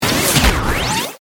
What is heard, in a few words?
Stinger Warble, Stinger, Warble; BlastWave